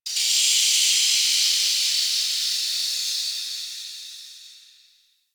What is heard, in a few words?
Steam Blast; Warframe; Ambience; Hub; Tenno Junction Relay